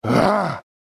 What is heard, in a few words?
Male zombie attack